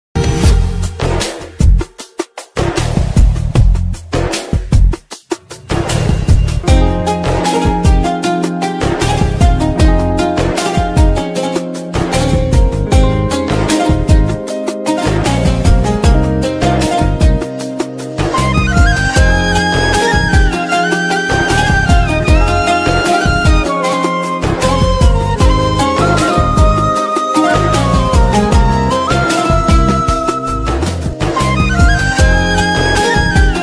heart touching ringtone telugu christian ringtones; jesus